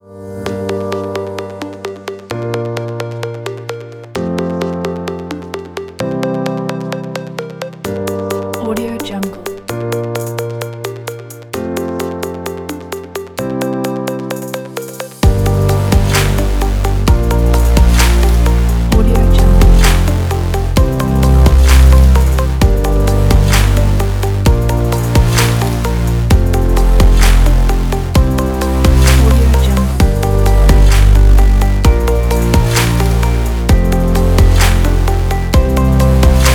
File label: abstract tech